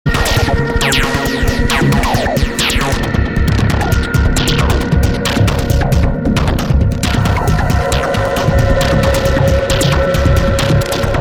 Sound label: bike flanger